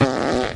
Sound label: fart shortish